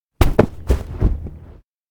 trip fall; koyomi; anemoi パッケージ版

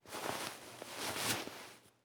cloth stroke; anemoi

